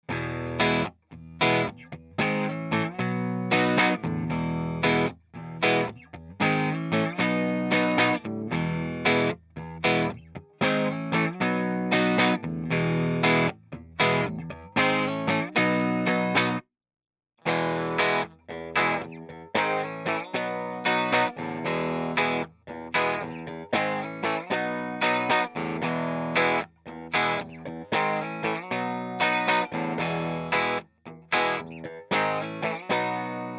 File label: Celestion Greenback